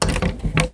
door open; Door Close

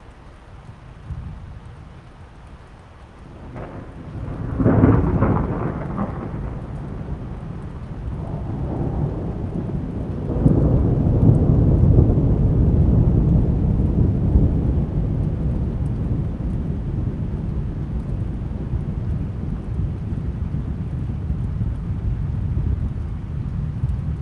ambient thunder with subtle rain short dry mono; fvtt sonniss gdc audio

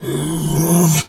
bear pain hurt groan; sonniss gdc

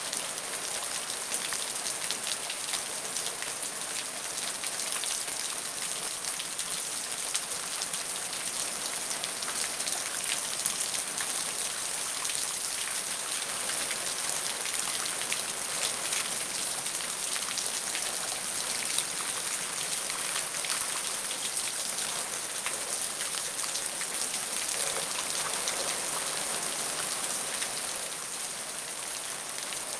ambience rain moderate loop; Sonniss GDC

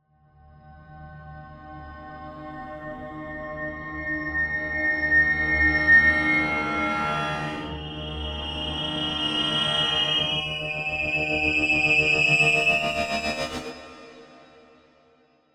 texture sci-fi atmospheric element; sonniss gdc

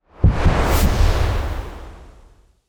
misty step intro generic, spells; 2nd level spells

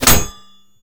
bullet impact metal heavy; sonniss gdc

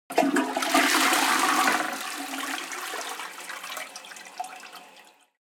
toilet flush; sonniss gdc

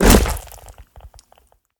smash gore juicy smash; sonniss gdc

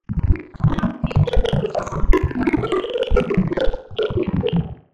alien beast deep medium; sonniss gdc

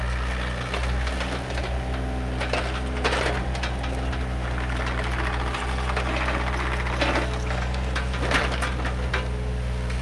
ambience construction site; fvtt sonniss gdc audio

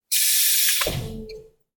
machine mono; sonniss gdc

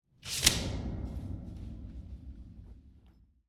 metal plate duct tape pull

